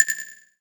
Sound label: multimedia rollover; sound effects one